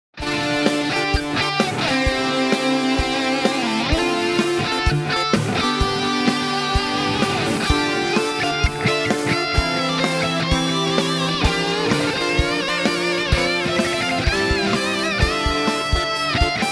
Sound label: synth song